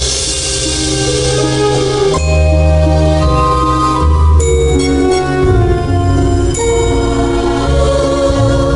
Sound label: Loop